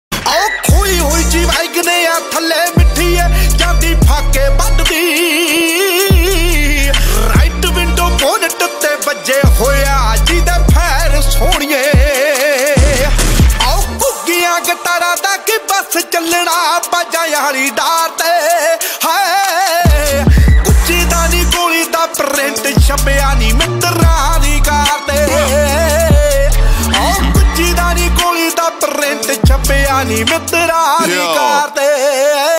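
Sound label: ringtone, punjabi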